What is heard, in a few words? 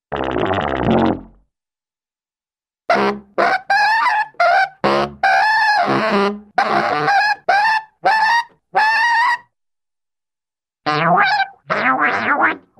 Baritone Sax Playing Blurps & Short Sad Melody; Hollywood Edge Cartoon Trax